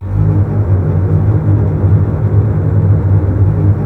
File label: G#1, Basses Tremolo, String Ensembles; Miroslav Vitous; 90 s Sample CDs